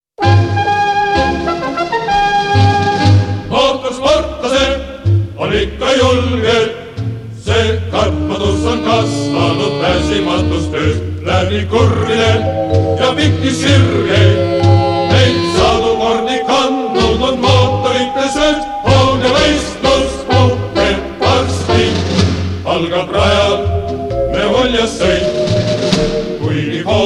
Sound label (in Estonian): Meeskvartett, Mootorisportlaste marss; Eesti Filmimuusika; Eesti Kullafond 1